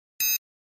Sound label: Flash Beep HF Elect To, flash beep